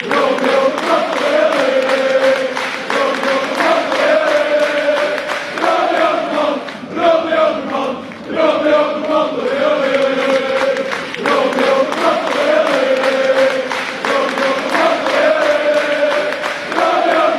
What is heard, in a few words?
ole ole, fanchants; romeo vermant